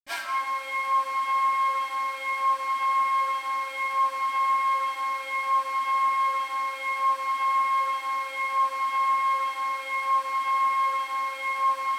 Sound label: ambient